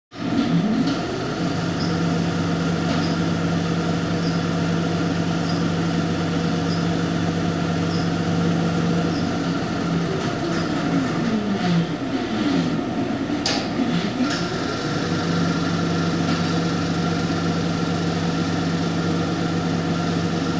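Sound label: large film projector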